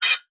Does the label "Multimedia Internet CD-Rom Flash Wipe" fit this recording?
Yes